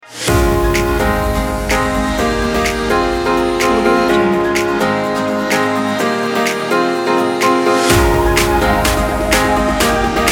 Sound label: dance kit